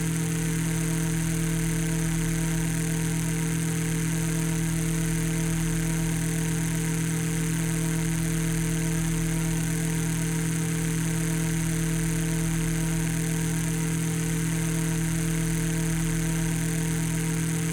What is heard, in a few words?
electric grid